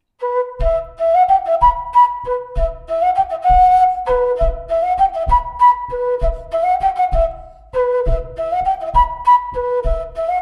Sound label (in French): Montagnarde; Recueil De Raoulx